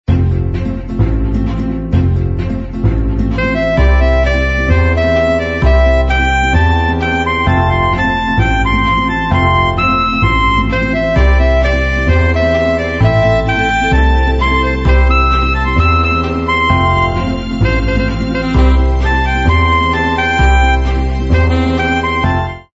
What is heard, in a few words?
royalty free music